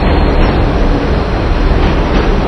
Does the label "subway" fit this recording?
Yes